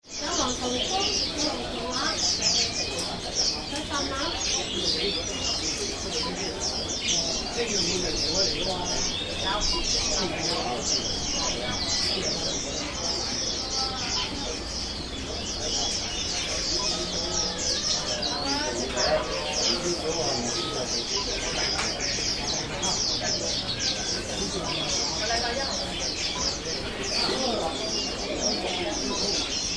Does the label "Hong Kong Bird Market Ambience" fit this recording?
Yes